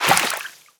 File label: water entry